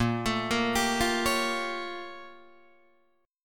A sharp Minor Major 13th, A sharp, down, Guitar, DADGAD